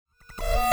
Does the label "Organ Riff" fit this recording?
No